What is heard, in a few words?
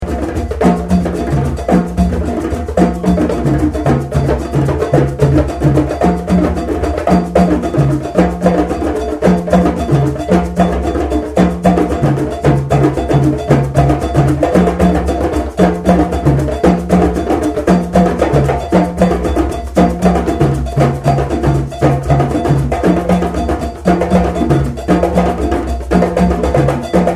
coucou, drums